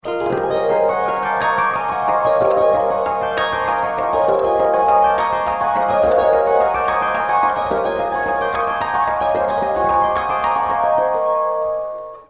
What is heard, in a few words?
GTR HARM